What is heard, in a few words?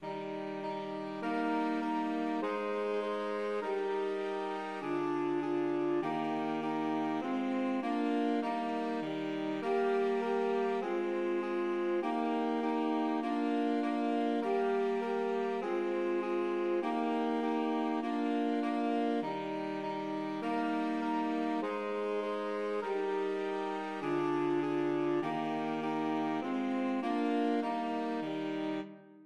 twinkle twinkle SAX